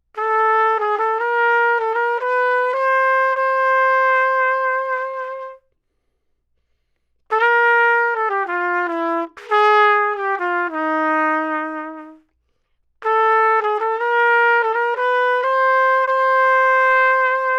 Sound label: TRUMPET